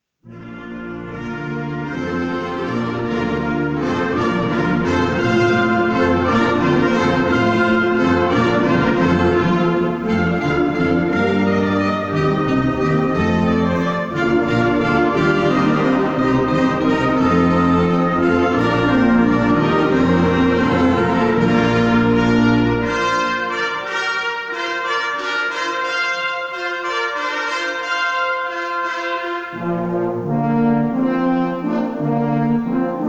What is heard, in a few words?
Hornpipe